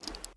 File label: Glasses Drop; Game rip; MiSide